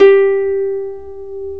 HARP G4; PlatinumPhattCD1; E-MU Producer Series Vol. 8 – Platinum Phatt; Mo Phatt Instrmts

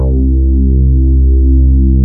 bassman, Poly-6

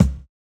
Kick, jungle breaks drum sounds

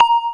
A#, Synclav FM PERCUSSION; Big Fish Audio

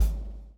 BLOW; Spectrasonics - Backbeat

